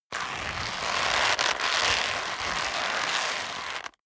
leather creak long hard

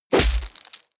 heavy punch gore

